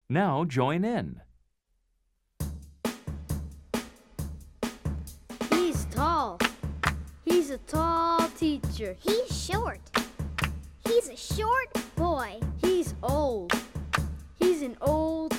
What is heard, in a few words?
Chant Group; logos english; Let's Chant, Let's Sing 1